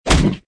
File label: attack